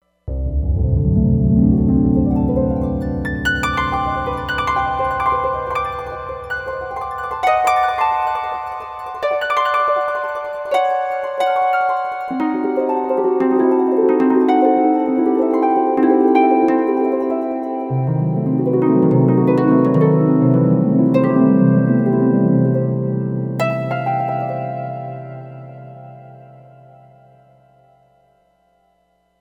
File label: Aerial Harp